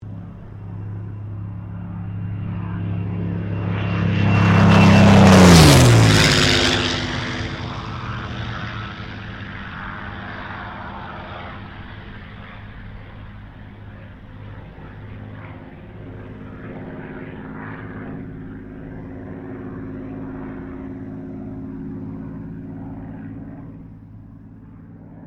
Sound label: AIRCRAFT SUPERMARINE SPITFIRE MK-XVI PASS; Airplane Sound Effects; Vehicles